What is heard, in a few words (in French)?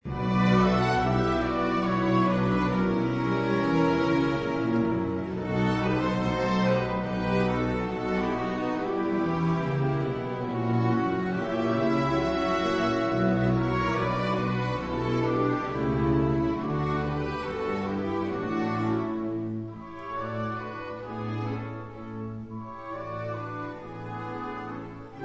PASSION SELON SAINT MATTHIEU Dernier choeur fin Concert; BACH; Concert Halle aux Grains Toulouse 2000